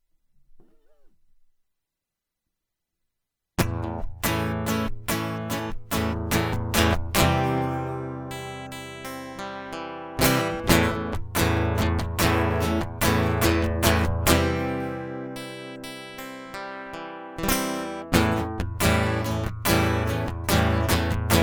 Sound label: Guitar Loop